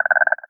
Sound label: frog croak single; DeadFright